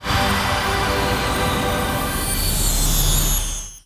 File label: near win; netent